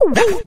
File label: not a loop at all, Beatbox; Drum loops